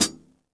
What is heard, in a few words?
hihat - close; JazzFunkKit